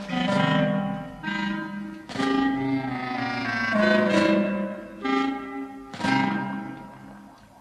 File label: Saxophone; Dalek